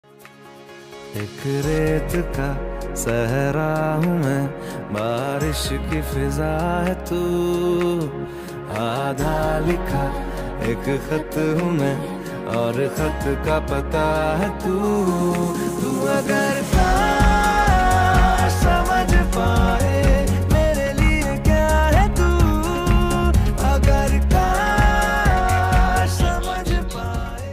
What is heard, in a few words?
unplugged version, ringtone